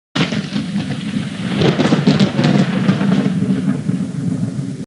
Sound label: Classic Thunder Sound; Roblox